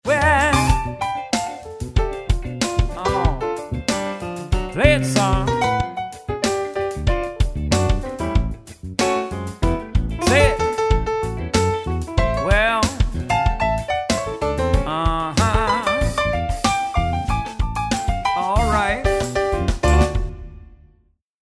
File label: piano rnb jam